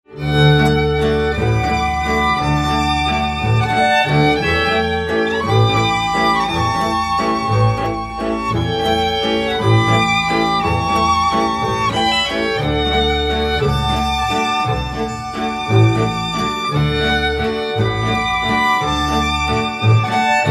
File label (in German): walzer; ade